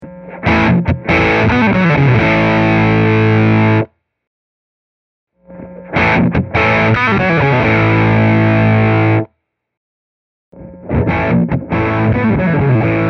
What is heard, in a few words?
filter